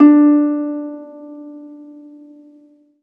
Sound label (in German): HARFE D1; Best Service